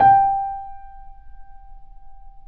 Mp G 0 off, Grand Piano off mic; AKAI S6000 CD-ROM - Volume 2

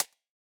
hats C, hip-hop drum kit; 99Sounds x Monosounds; Moon

